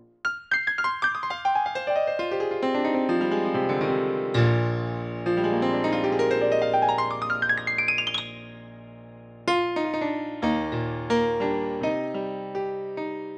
akoustik piano bechstein; native instruments; the grand

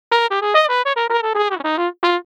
bucket mute, trumpet; samplemodeling

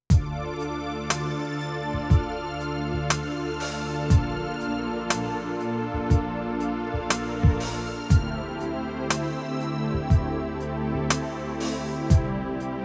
son pad; korg

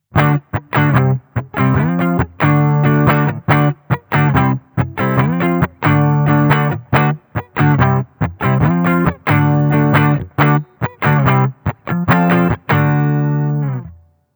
clean neck gibson; custom77 cs4

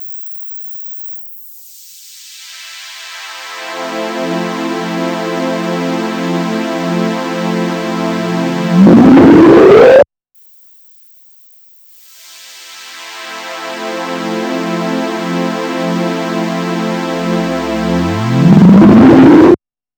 manual hpf, waldorf blofeld

